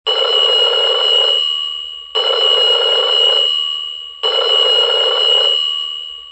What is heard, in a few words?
Bell